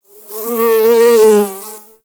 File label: Exterior Foreground Bug Flyby; Ambience; Warframe; Duviri